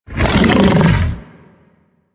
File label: Predasite Vocal Attack Short; Warframe; Hunting Critters